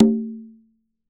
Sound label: CNG G O B, congas tuned; Q Up Arts; Denny Jaeger Private Collection